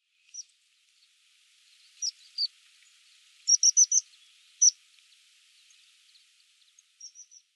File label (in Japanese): tahibari, toyooka